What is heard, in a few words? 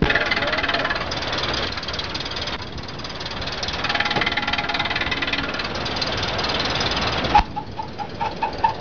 old car sound